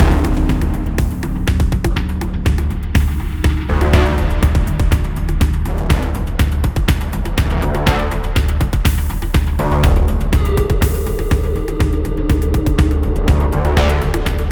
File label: pulse